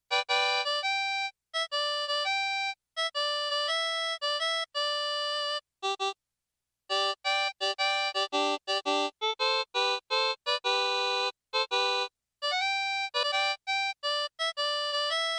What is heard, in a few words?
harp